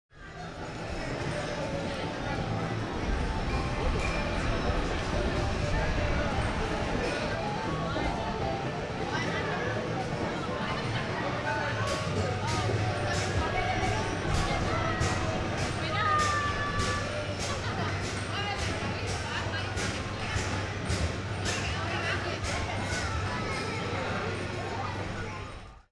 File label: Amusement Park